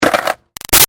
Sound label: Skateboard Hit Landing Scrape; Skateboarding; Sports; Big Room Sound